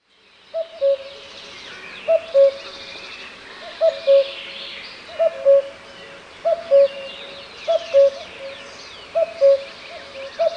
cuco comun

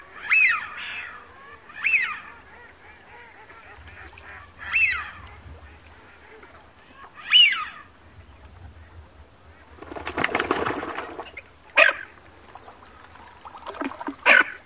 silbon europeo

